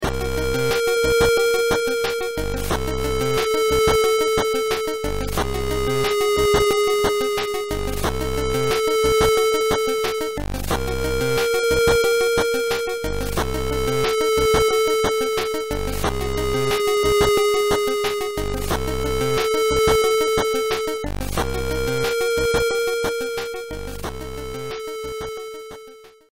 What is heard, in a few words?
lunar stage critical